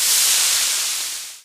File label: toxic slime sizzle; ambient; canals; source engine; hl2; video games